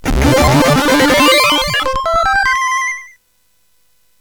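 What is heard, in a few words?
sfx, MOS 8580; hvsc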